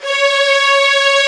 Fast Str, cis5; BGM; Nintendo; July 2020 Gigaleak

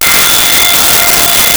Harmonica F5; Nintendo; July 2020 Gigaleak

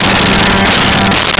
Pipe Organ G3; Nintendo; July 2020 Gigaleak

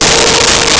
Alto Sax Bb4; Nintendo; July 2020 Gigaleak

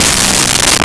Slap Bass C3; Nintendo; July 2020 Gigaleak

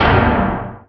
metal hit; Nintendo; July 2020 Gigaleak

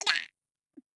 death violent, grunt involuntary; covy involuntary

